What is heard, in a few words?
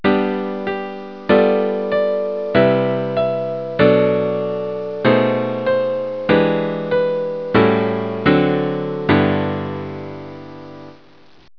piano track